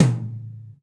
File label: tom; foxdot default